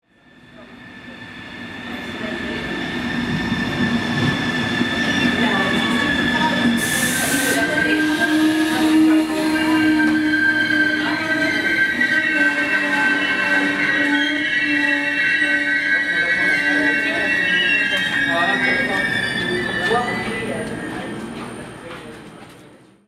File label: GERMAN TRAIN